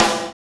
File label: SNR, Snares; AMG; Now CD-ROM (Roland); NOW! Drums